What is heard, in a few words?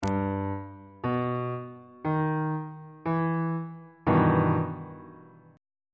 e minor major 7th 1st inversion on bass clef